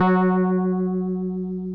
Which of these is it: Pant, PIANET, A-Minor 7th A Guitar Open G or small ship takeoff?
PIANET